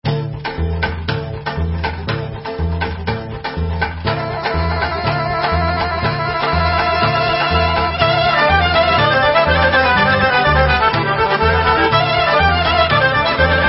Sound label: Kolo Instrumental; Cupino Kolo Dovino Kolo